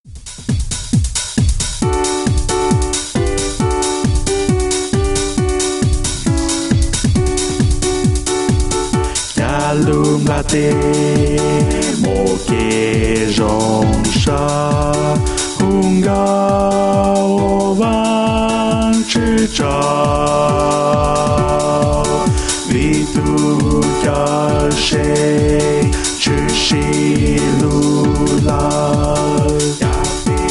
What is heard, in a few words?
bass